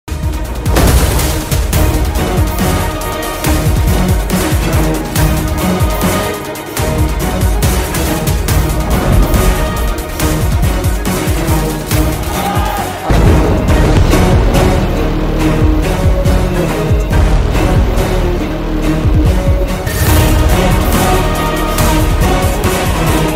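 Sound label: movie mass background music; republic movie; sai dharam tej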